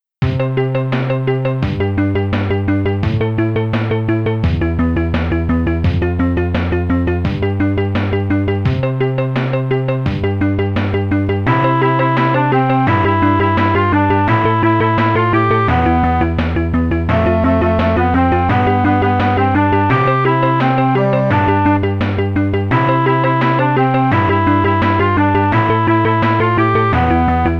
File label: Palcelbel's Cannon, Archimedes; Lemmings